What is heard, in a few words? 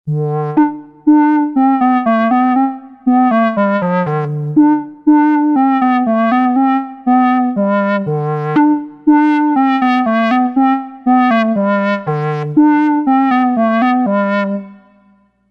PHM clarinet